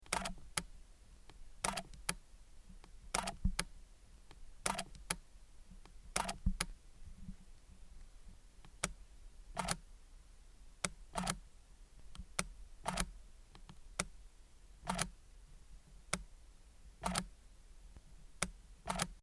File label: Canon EOS 50D Live View Mode 1 and 2